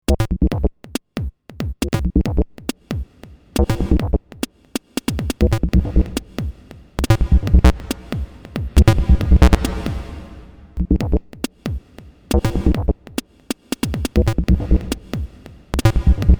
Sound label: minimal